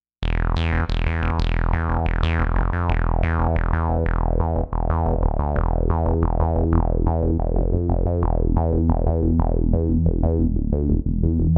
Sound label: lofi sequence; JUNO-Di Patch Demo